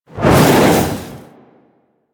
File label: Look Whoosh; Ballas; Warframe; Quests; Chimera Prologue